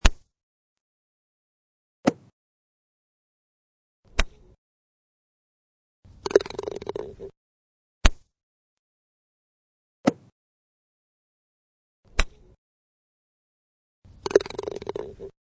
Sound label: Golf; Golf Round 2 Ringtone